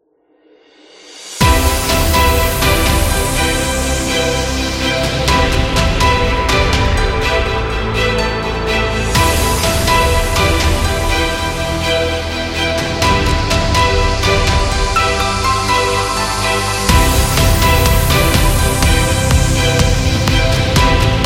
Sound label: Fashion Epic